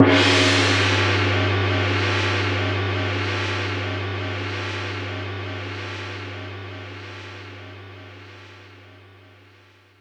gong

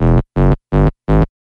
bass, Loops Phonky

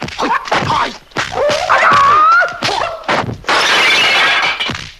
martial arts

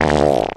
fart medium; Farts

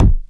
earcandies, Kicks Medium

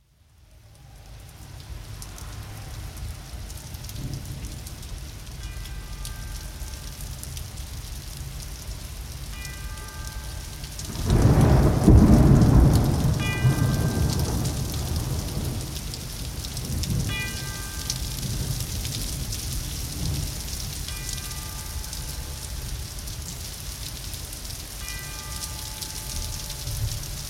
rain and bells

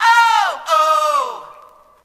oh ohhhhh, Stabs

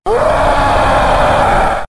scream monster; ooh ahh

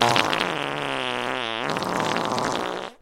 fart long

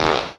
fart short

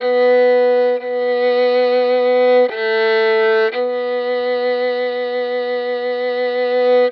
strings, Sad

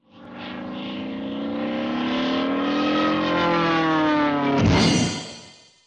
air crash, Vehicles

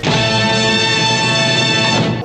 Stabs

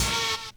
Stabs

